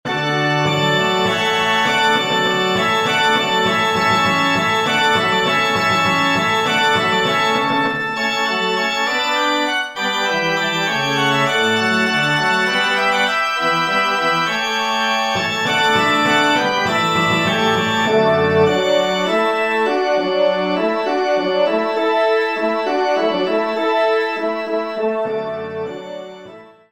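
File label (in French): Water Music, Trompe Orgue Timbales; Haendel; Teknia; Auteur : Georg Friedrich Haendel (1715)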